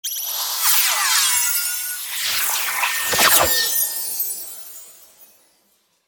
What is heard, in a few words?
Magical fairy heal spell